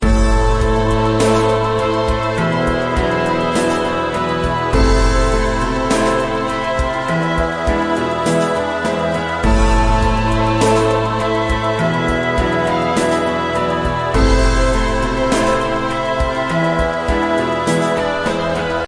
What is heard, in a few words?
Epic 8 bar loop; Hollywood; upbeat